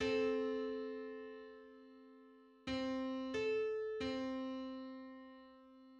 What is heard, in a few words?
Eight-hundred-fifty-first harmonic on C